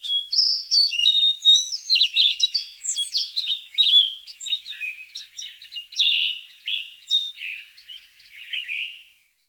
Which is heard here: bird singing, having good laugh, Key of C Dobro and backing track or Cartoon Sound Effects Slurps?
bird singing